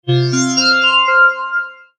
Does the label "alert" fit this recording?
Yes